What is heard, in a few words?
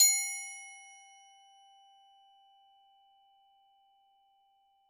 Hard plastic f G3, keys; fleet keys 2 Samples